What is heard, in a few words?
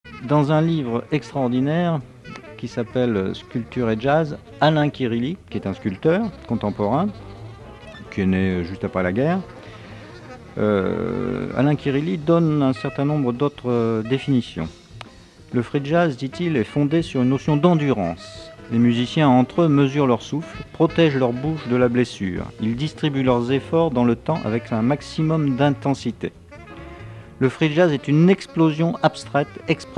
free jazz